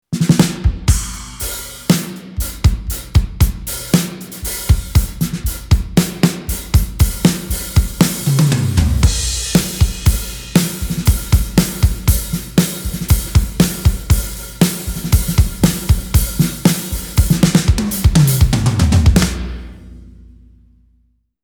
Drums (Short room); Master Room XL-515

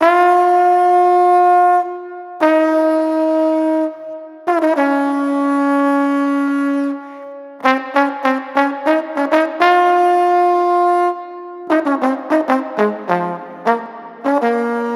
Master Room MR-III Trombone

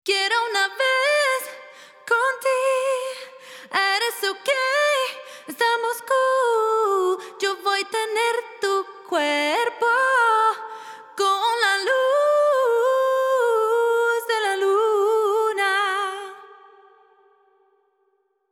Female vocal; akg bx15; Nevo

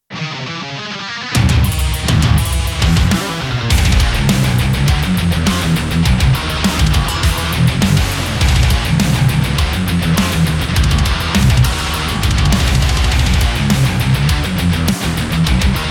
higain cabs

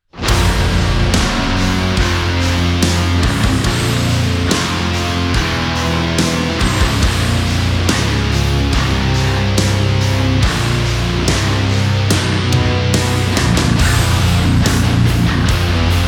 higain kemper

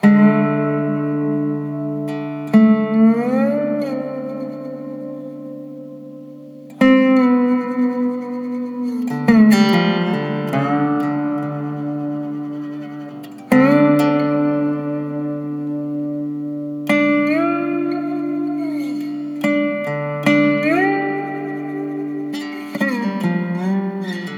Dobro

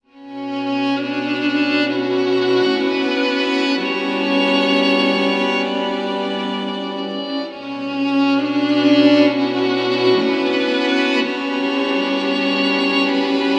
Master Room MR-IV Strings

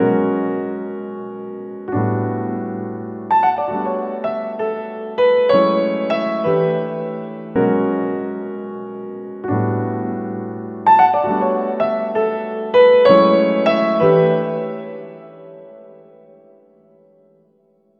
Master Room MR-III, Grand Piano

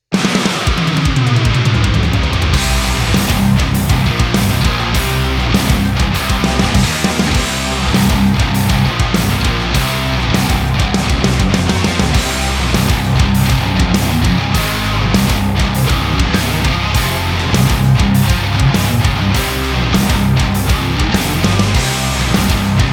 higain; kemper; starchaser

